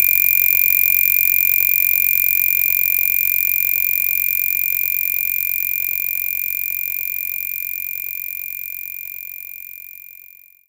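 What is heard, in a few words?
Xylophone E4 Looped; knights try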